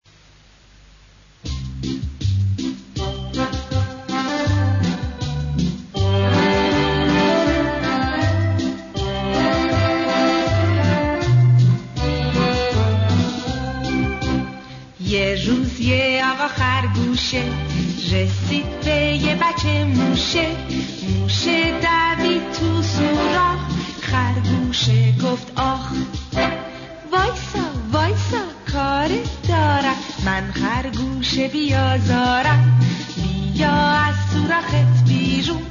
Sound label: Happy children's song